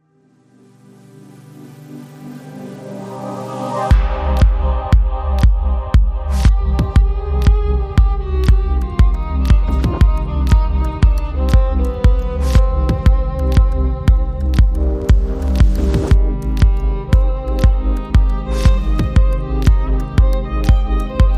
Adagio In G Minor Remix No Bass; Albinoni

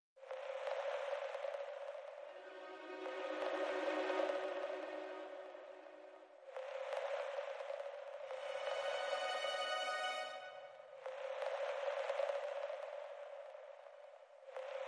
Pad And FX

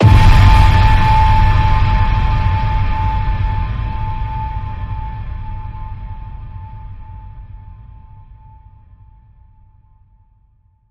Ping Pong Passion, A

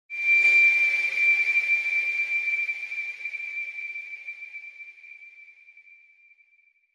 Hi Pitched Ping

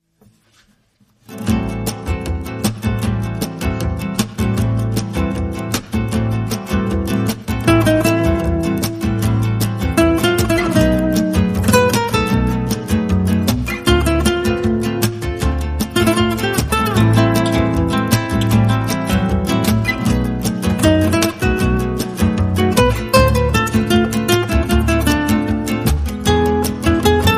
No Percussion

